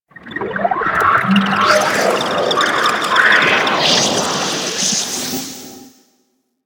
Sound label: Excavation Scanner Random; Missions